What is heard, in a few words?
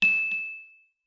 Rupee Bounce; A rupee bouncing (Recorded)